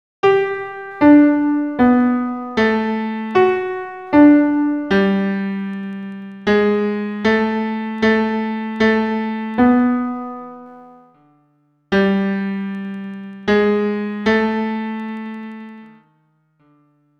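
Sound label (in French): ténor piano seul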